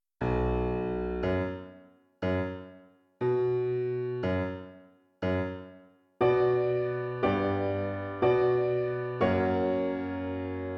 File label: Piano